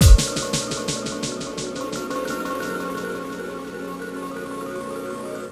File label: Dub Drum + FX; Sonic Foundry (Sony Creative Software); Ambient Grooves; Dub Hits